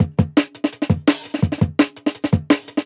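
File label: Jungle Breaks, 168 bpm; Original Jungle Breaks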